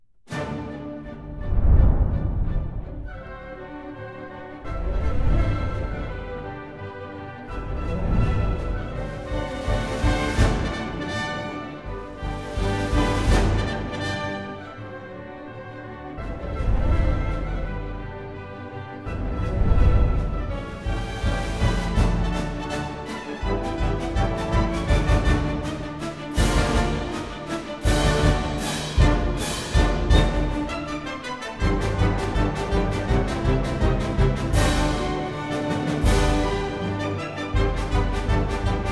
Thunder and Lightning, polka schnell for orchestra; Ein Straussfest; Unter Donner und Blitz